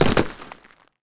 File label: land asphalt; STALKER; IWP